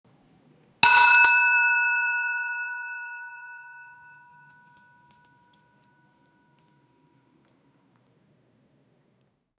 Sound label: bowling strike sound